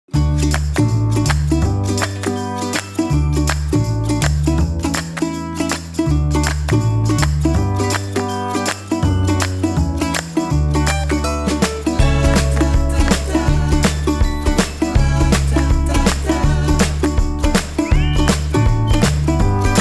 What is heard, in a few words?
Jingle; Expedite Home Loans; business marketing audio